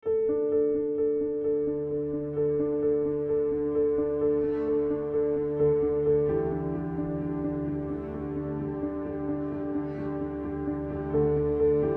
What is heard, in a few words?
Piano; Woods; orchestral